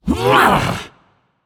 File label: grunt jump; smite; xbalanque